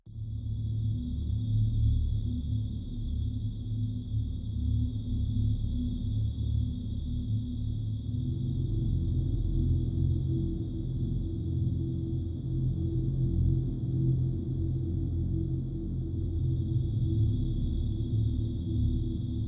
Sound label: mysterious ambience